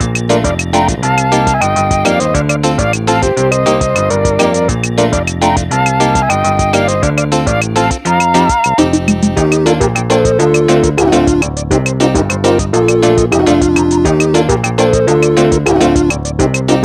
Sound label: Kitchen Saw Game Music; Spookware